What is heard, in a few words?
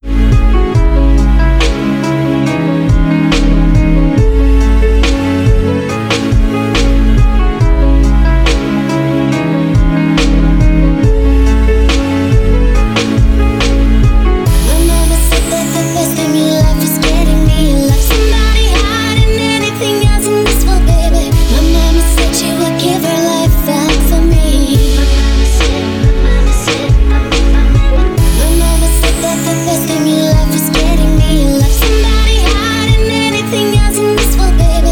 vintage beat